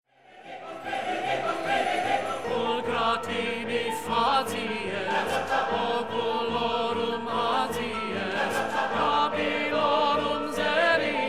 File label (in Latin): Veni veni venias Coro I Tenor up to tempo